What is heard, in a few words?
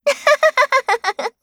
laugh; Eternal Return; Garnet; KOR